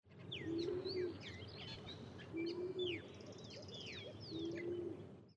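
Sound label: Paloma Araucana, Columba araucana, Chilean pigeon; cantos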